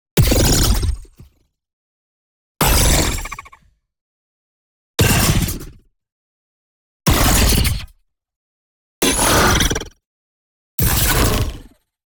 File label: NEON ZAP Energy Burst; boom library